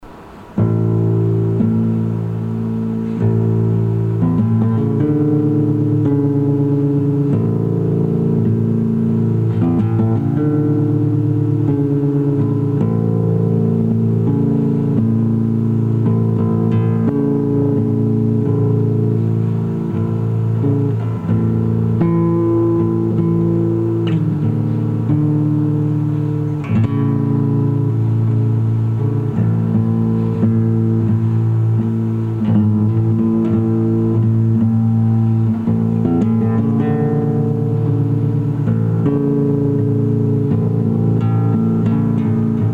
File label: Guitar